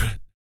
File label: PIERCD LIPS, vocal; ILIO; Vocal Planet VOL-2; Blues & Soul